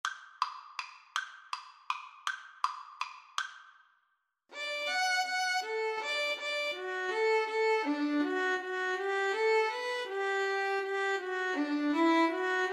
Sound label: violin duet